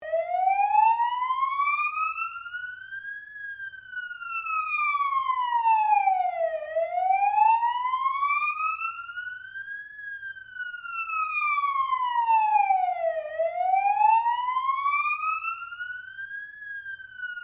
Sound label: SIREN POLICE AUSTRALIAN LONG LOOP EXTERNAL STEREO, Australian emergency service sirens